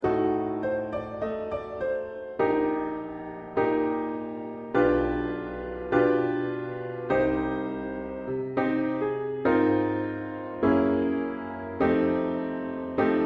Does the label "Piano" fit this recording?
Yes